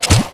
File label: Enemy Stamper Fall; disney star smash android ios mobile gamerip 2020